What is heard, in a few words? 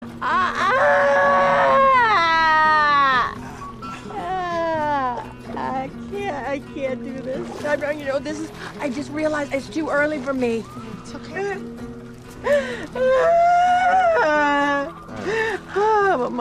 crying; jennifer coolidge